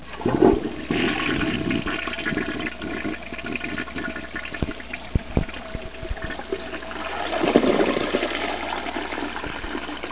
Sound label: toilet